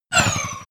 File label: sci-fi laser shot short; soundpool